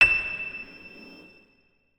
notes, keyboard piano; Hideout Furniture

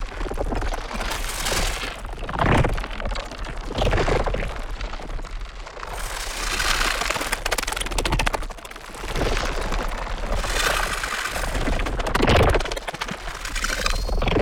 seed idle, anomalies; Arrival

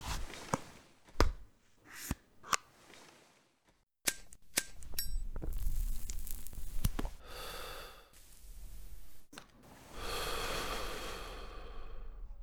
ciga, Cigarettes, item usage; Asnens and Groks Better Cigarettes Animations

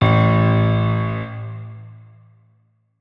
notes, keyboard piano; Hideout Furniture

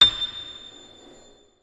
notes, keyboard piano; Hideout Furniture

